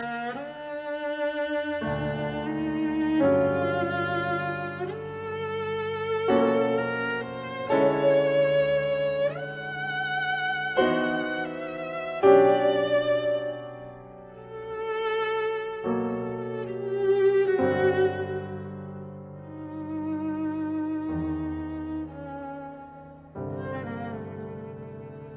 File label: Cello Sonata, Largo; Schifrin